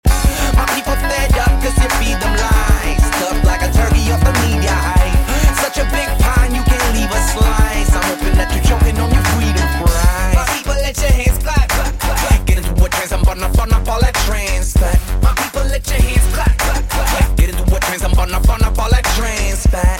Trance

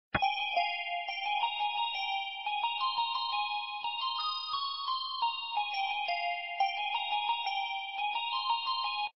Chime Msg

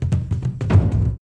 Drums

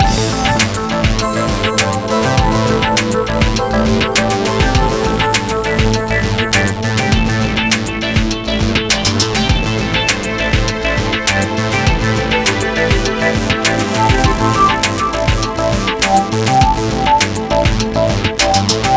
Menu Sound

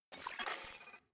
Incoming Alert; ISAC

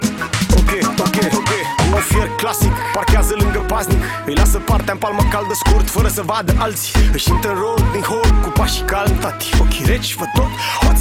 Game Over

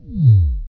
Logoff Sound; Xfire

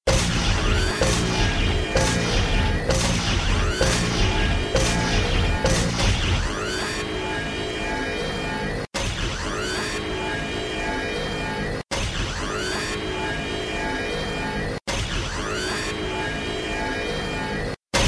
Dialing

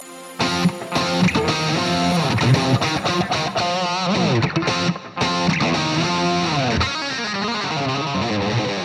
Electric Guitar Msg

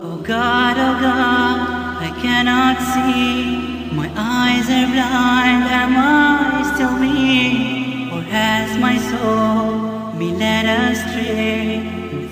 Last Breath

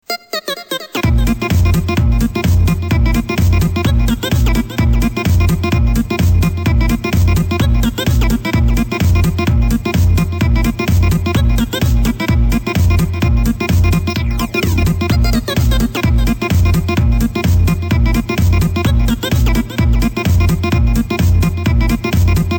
Dance Club

